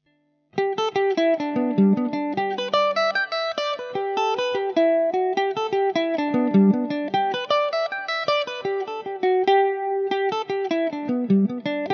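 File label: jig G major mandobird, irish mandolin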